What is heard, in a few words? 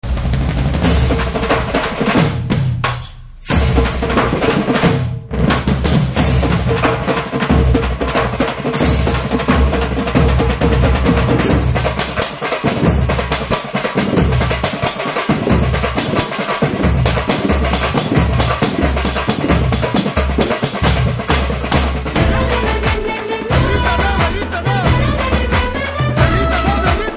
BGM Mass Drum Beats Ringtone